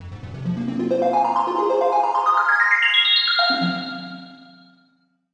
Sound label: synth; Diffusion; Processed